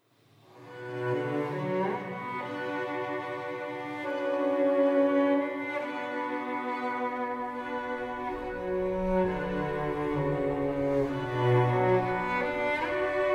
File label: Prelude; Prelude 6